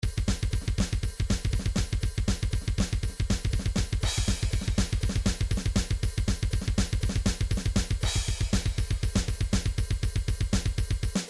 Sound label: drums percussion; midi vol 4 drums percussion